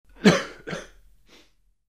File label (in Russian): mujskoy kashel